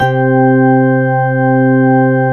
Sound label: drawbar organ; Zero-G; Funky Elements